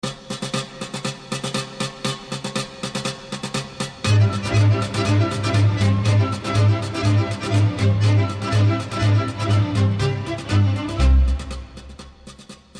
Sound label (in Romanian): Sirba in Caruta, Roumanie